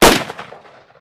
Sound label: Steyr Ssg 69 Gunshot; Redmatch 2